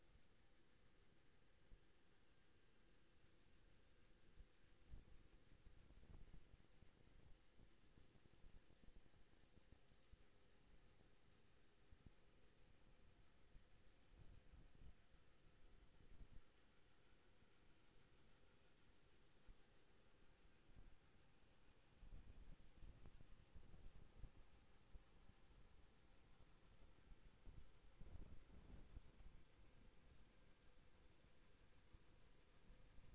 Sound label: HYDROPHONE 16000Hz; JASCO AMAR HYDROPHONE 740